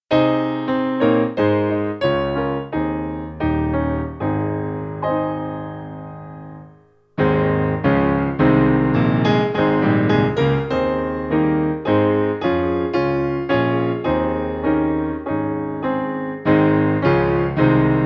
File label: Piano; hymnary